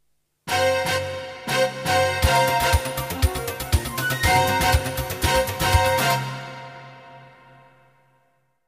jingles; 90 DDD Jingles

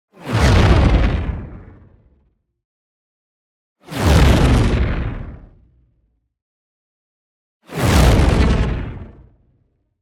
WHOOSH LARGE Charge Fragmentation; boom library; Matter

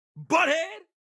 car reaction; Blind Drive

